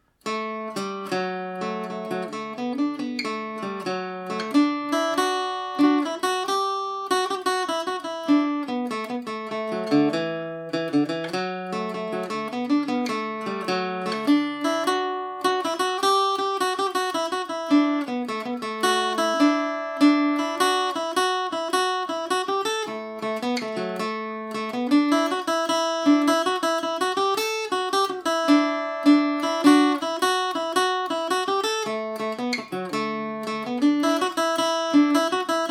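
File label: D major at 40, irish mandolin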